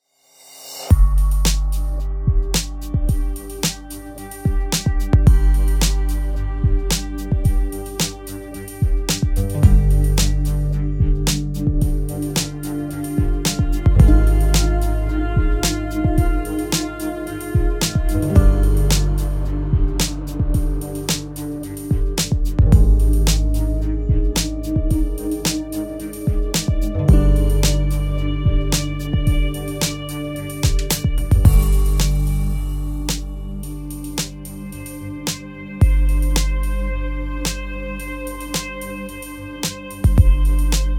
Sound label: Tense Drive